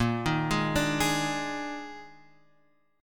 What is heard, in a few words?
Eb Major 7th Suspended 4th Bb